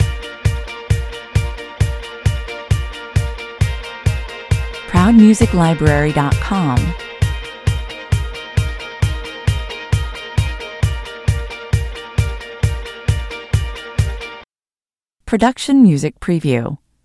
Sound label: fast car loop